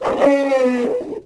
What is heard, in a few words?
Elephant Die Vox; Civ4 warlords